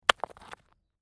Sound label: Rock Fall And Tumble Onto Rocks; A small stone or rock falls on other rocks; Rock impact and settle; Rock Sound Effects; Lynne Music